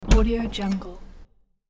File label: wooden door fast accent; hollywoodedge